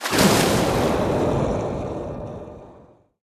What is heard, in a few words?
torpedo; World Of Warships; weapons